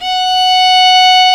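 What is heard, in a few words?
viola F#4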